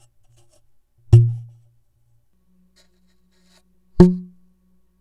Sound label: tube slaps